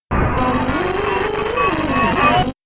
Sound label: game glitch